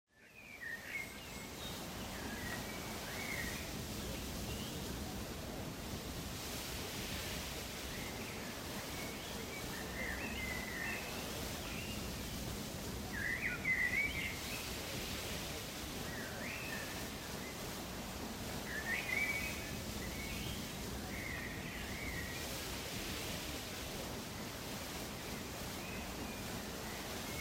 Ambience; Microsoft Studios; Age of Empires III - Complete Collection; cinematics

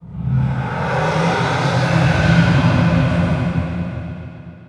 shout; RYL 2

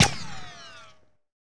Rico & Whiz By Single; Sony Pictures Sound Effects Series; Ricochets - Hits - Whiz Bys

